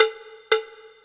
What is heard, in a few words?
cowbell